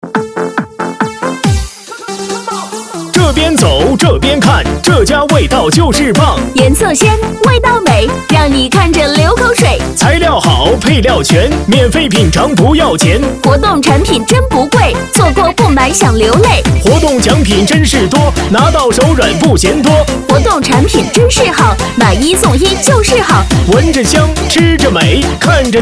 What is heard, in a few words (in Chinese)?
男 戏曲 男女喊麦 蛋糕店活动